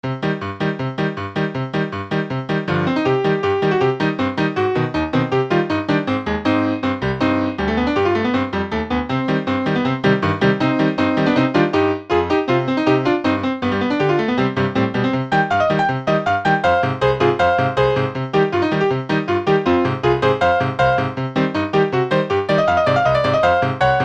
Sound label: evil keys